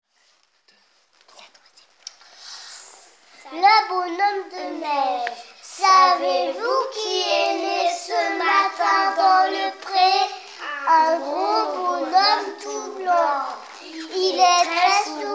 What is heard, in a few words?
Vocal